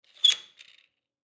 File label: Foosball Table; Foosball Table Change Score Board